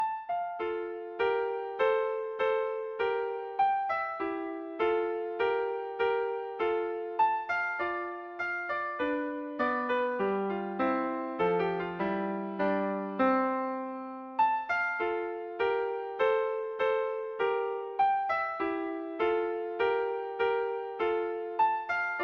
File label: clarinet duet